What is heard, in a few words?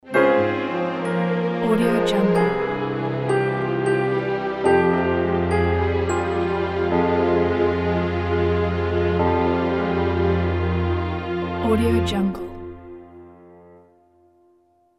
requiem ident